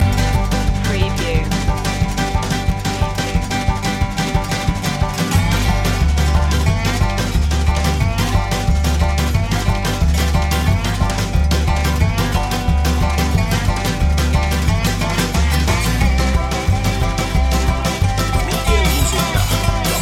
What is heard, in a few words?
hickabilly hoedown long looping